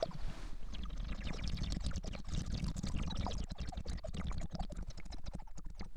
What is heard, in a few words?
slurp; meat madness redux; godot